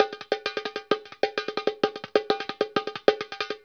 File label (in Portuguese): maos repique